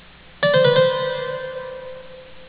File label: Cadence (turn)